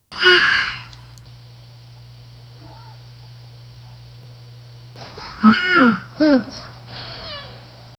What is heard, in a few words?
outside with rescuers, animal